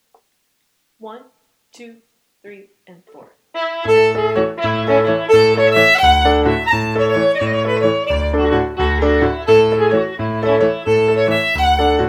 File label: fiddle and piano Medium